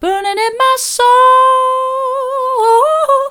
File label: burning in my soul mid, vocal